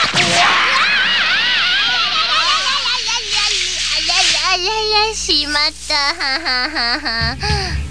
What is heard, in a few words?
ayayayay